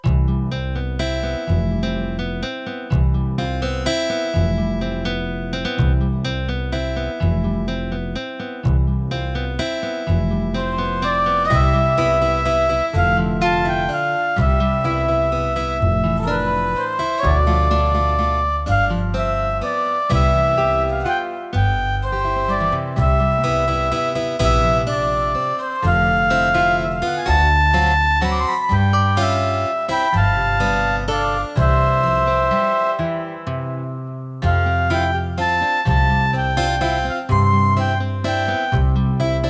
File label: flower waltz; ex-po 97